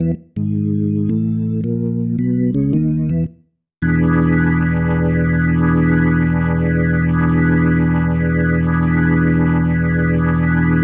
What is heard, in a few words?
Ending, Organ